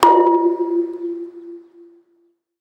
Baseball Hit Metal Pole

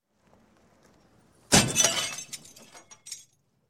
Crash, Auto Medium Head On With Glass; Sound Ideas 2000 Series

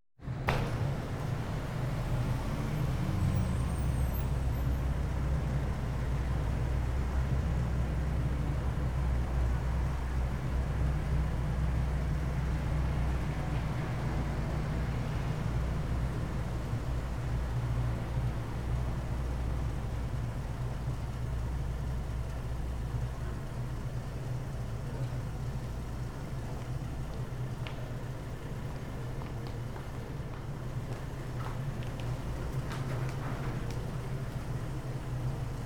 Parking Lot General Atmosphere; KMP Film Video Sound Effects

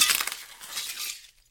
glass; firefight

